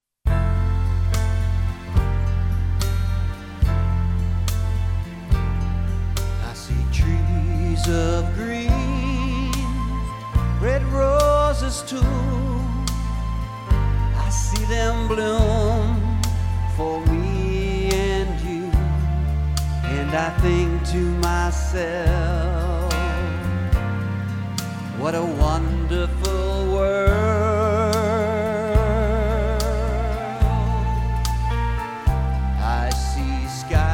Vocals, Acoustic Guitar

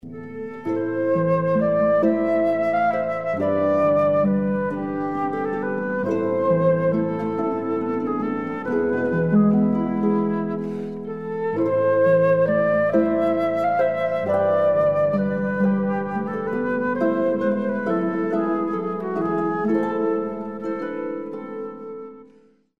Harp And Flute; What Child is this

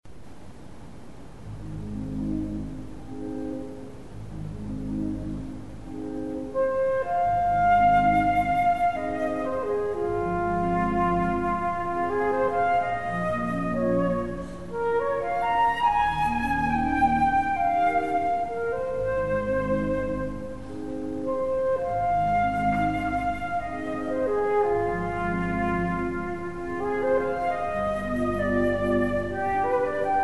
Romantic, classical duo

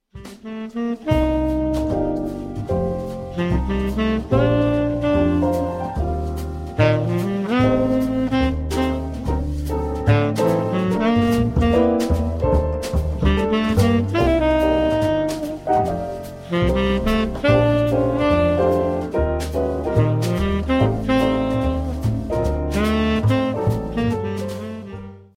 Swing with Sax; jazz-band

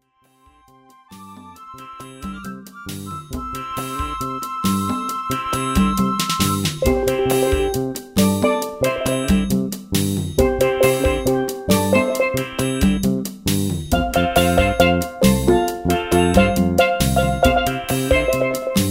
Steel Drum

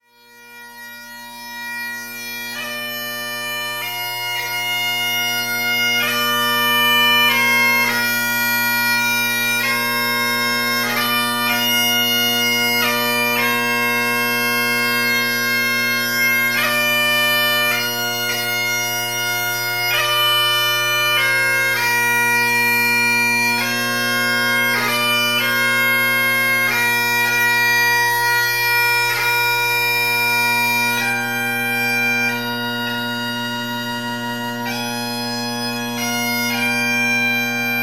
bagpipes; Celtic bagpipes

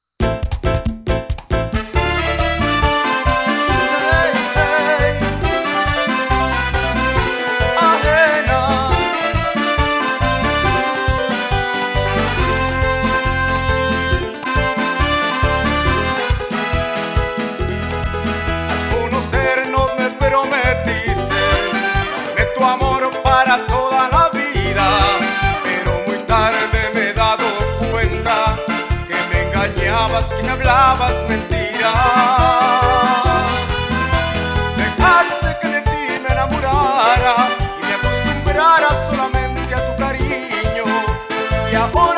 Merengue; salsa band

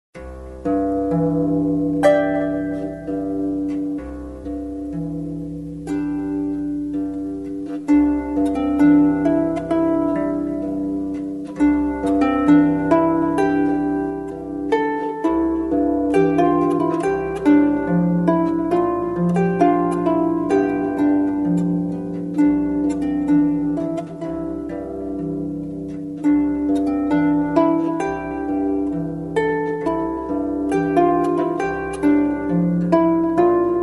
harp

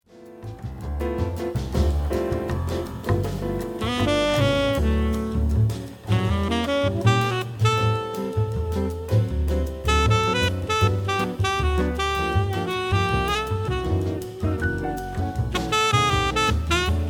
Jazz Band

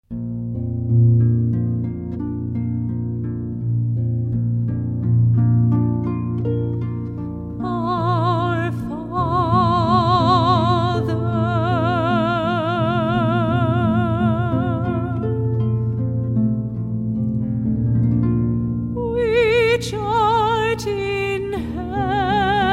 Classical Harp, with voice

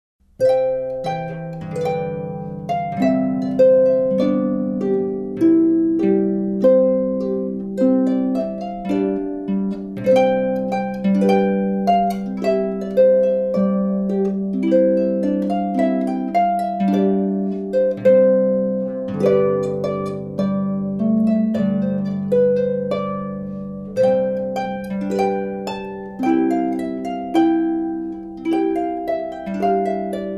Trumpet Tune, Harp

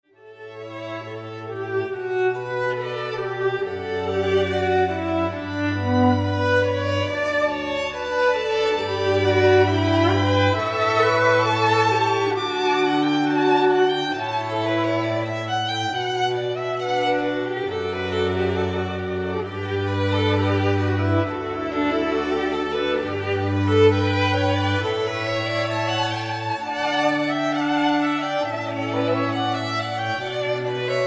string quartet

